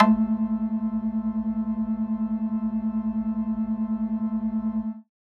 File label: A4 Lead